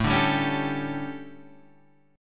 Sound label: D Major 7th Suspended 4th, chord